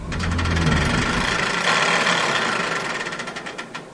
liane descend; Arc En Ciel Et Le Lagon Merveilleux

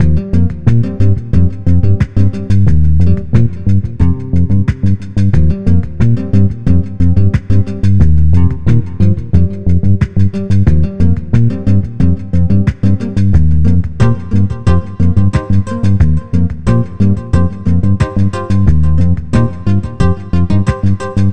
musique; MOBICLIC41; Demo Atlas

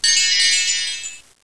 Clochett; MOBICLIC 14; europe

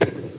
crabe; Emme; Arc En Ciel Et Le Lagon Merveilleux; French Version

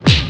Baffe; Mobiclic51; LANGUES

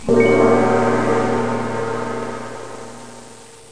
SUCCES

